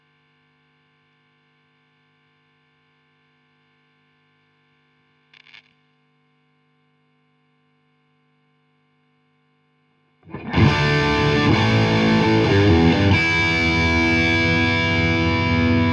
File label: lid noise test